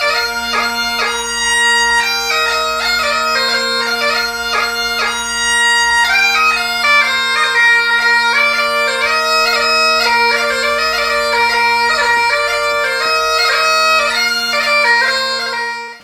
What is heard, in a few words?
Bagpipe